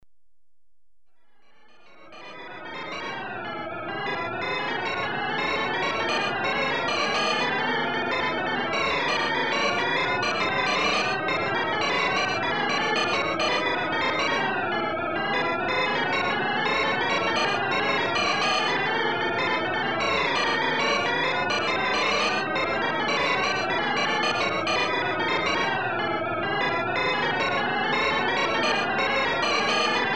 Talking computer (mid pitch with warble), Electronically Generated Sounds; Sound Effects Library